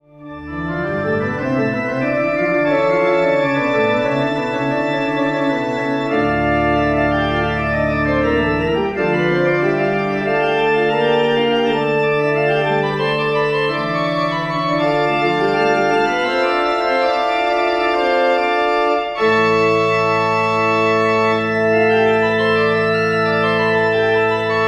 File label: sampler coro byrd fantasia